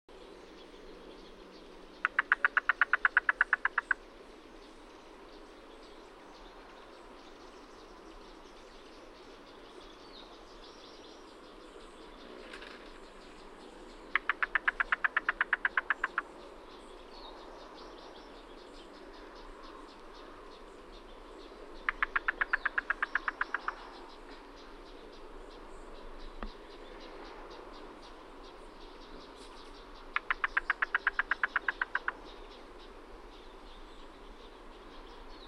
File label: Cuckoo Knock Call, Yellow Billed Cuckoo; Yellow Billed Cuckoo Vocalizations; YBCU